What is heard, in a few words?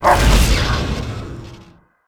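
Weapon sonic bark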